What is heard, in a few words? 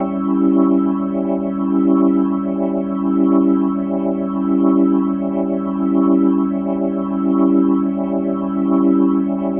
Medical Organ A7; Dominant 7; The Vitale Organ